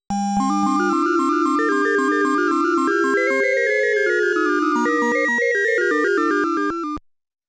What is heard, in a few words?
Challenging Stage (Clear); galaga